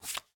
Drop Card; Game rip; MiSide

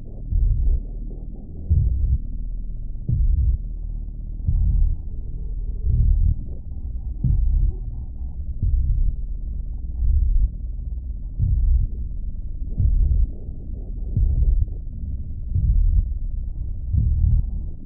Ambient Evil; MiSide

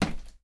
Dance Step; Game rip; MiSide

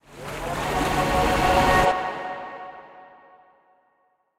Glitch Go Inside; Game rip; MiSide

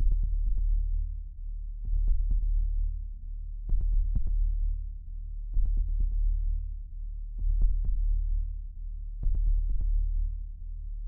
Music Bad Player; Game rip; MiSide

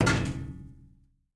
Flash Hand; Game rip; MiSide

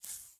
Snake Move; Game rip; MiSide

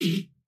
Hammer Time Stop; Game rip; MiSide

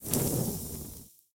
Pumpkin No; Game rip; MiSide

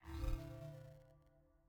Ring Move; MiSide

